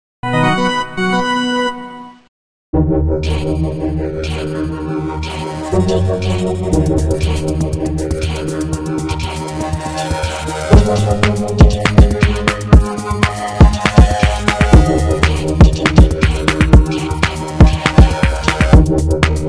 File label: Sampler Cheesy Organ